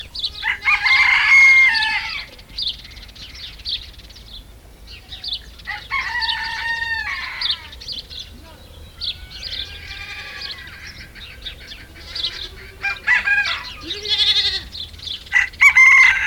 Amb Camp Chevres, Countryside; French

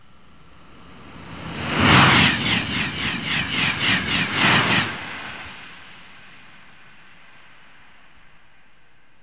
Pass Tgv Proche; French

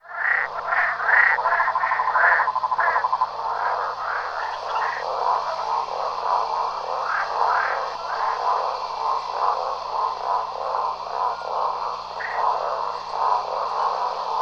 Amb Grenouilles Marais; french

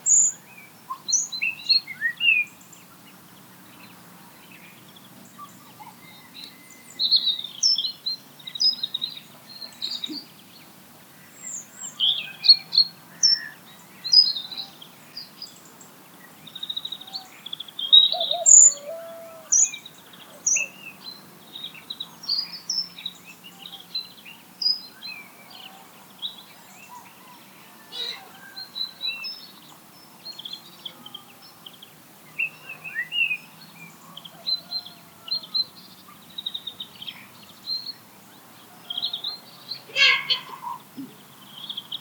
Campagne Ferme, Countryside; French

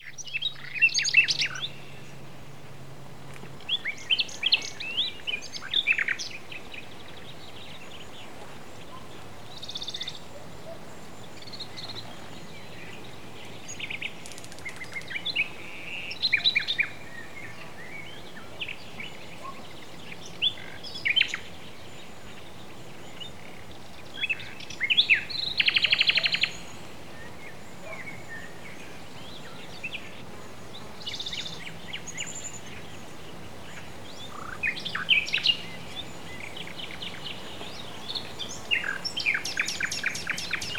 Oiso Large; French

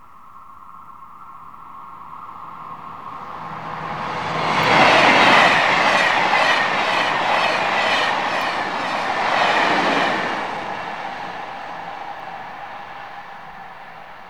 Pass Tgv Long Eloigne; French

